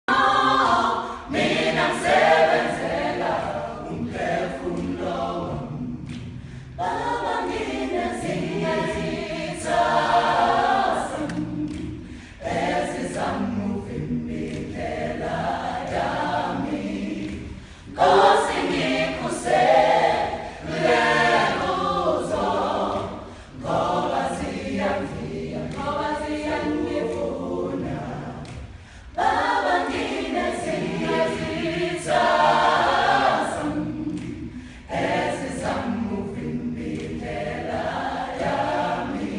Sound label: Gospel Choir